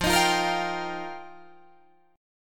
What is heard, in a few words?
F 9th Suspended 4th F Guitar Drop D, chord